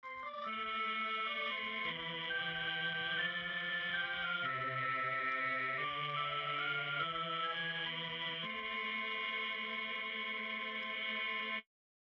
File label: Choir, tape sampler; electrickeys; Class Tron